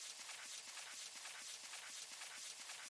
reel Spin; jackhammer

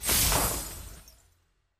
win line flash; fairyhansel

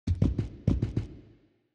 walking Wild Movement; godsoffortune

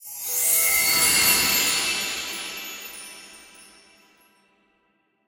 scatter win; wilderland

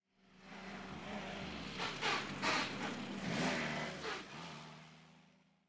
ambience pickup left to right; colossal pinatas

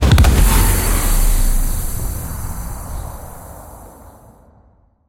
big Win End Explosion; arcane

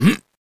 santa Pulls; jingle spin

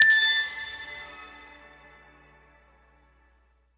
candy pick; fairyhansel

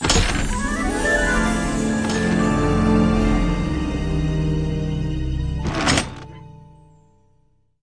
pick And Click Bonus; fairyhansel

